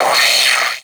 Sentient Brachiolyst Pain; Warframe

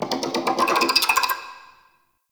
Interior Spider Clicking; Ambience; Warframe; Duviri; Murmur

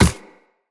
Run Ice, footsteps; Characters; Operator

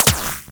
Shield Impact

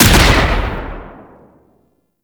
Arch Railgun Charged 2D Shot; Warframe; Archwing

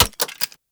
Shot Mech, Weapons; Acceltra Prime

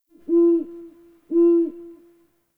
Tree Critters Scared; Ambience; Props; Warframe; Duviri

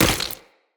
land flesh, footsteps; Characters; Tenno

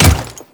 Royal Guard Default Step, footsteps; Enemies; Warframe; Grineer Royal Guard

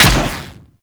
Inf Vomit Gun Shot; Infested; Weapons

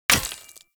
Short Sword Throw Medium Impact Rock; Player

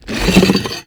Arm Drag; Enemies; Warframe; ManInTheWall

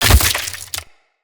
Root Spike Impale; Powers; Bromius

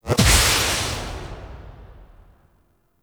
Grimoire Impact; Warframe; Tenno

